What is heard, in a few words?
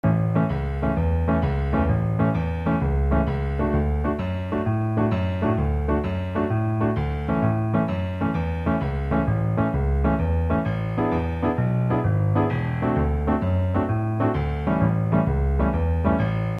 blues piano only